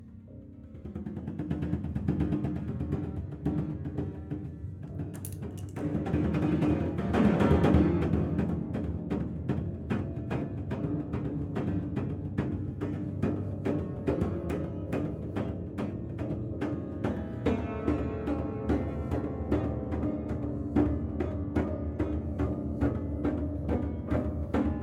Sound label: Intense Rythm